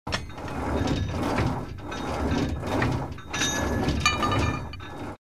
Power rack gym machine in motion

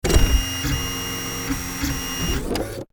Casino slot machine receipt printer

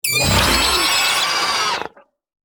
Pterodactyl dinosaur roar call

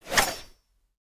Katana swings in air

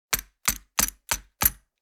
Metal miniature game board map moving

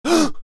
Frightened man gasp of fear

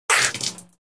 Human spitting spit water into sink

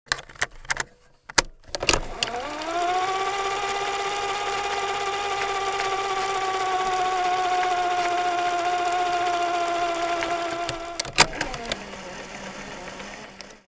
Cassette tape player record

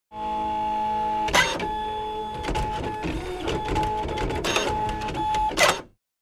Epson inkjet printer starting up

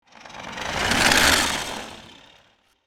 RC truck movement pass by; rc toys

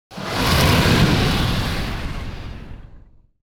Dragon aggressive roar attack

